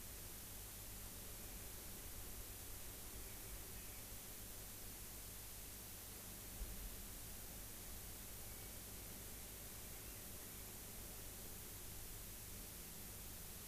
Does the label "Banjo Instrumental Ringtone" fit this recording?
No